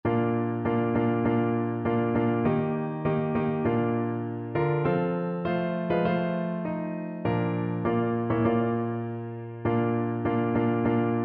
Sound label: power in the blood piano